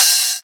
damped open hat sound C key, open hi hat one shot tuned to the C note